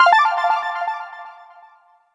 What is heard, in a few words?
match join